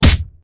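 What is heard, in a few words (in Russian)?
яростный удар по щеке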